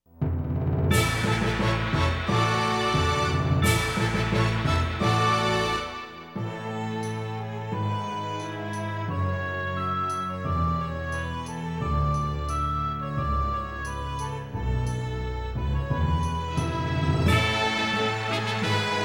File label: triumphant march